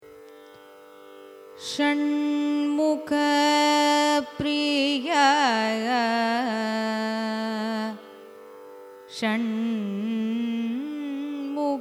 raga shanmukhapriya signature, carnatic music; Important Phrases; Listening Homework: Learning Ragas

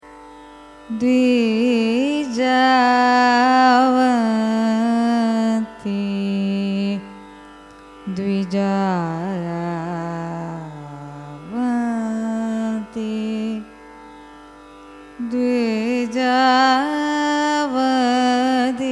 raga dwijavanti signature; carnatic music

